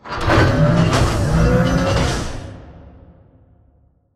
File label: Robot Arm Right Deliver Retract Close; Boomtown; Titanfall 2